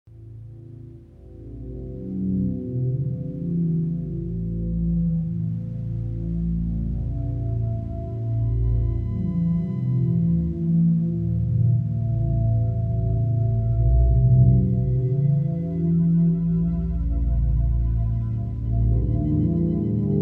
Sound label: Binaural